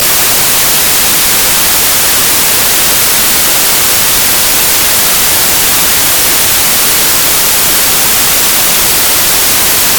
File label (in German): Rauschen